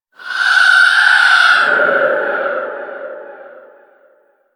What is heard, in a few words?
Rell Specter Vocal Death; Enemies; Warframe; Priest Quest